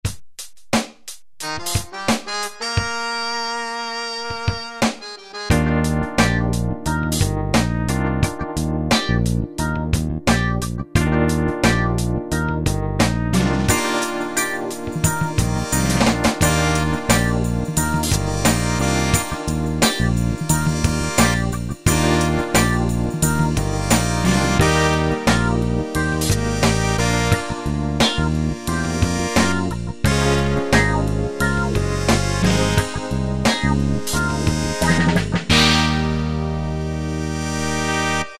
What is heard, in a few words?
Smooth; Preset Styles